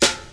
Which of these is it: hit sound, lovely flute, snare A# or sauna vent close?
snare A#